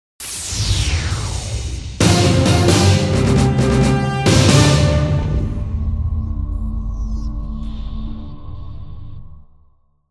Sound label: JINGLE BIVOUAC